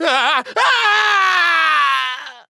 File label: Falling; paladins; MalDamba